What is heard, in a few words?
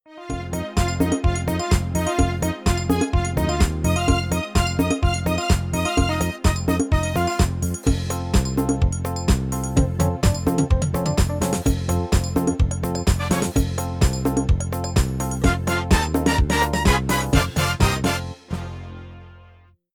Disco Samba; songpakete demo